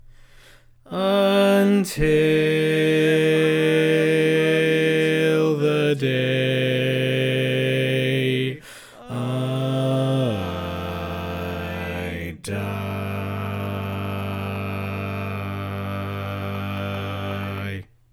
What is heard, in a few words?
Bass, barbershop